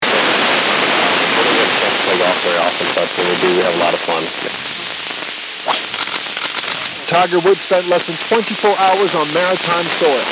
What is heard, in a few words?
tv audio typewriter; 59750; canadian; tiger woods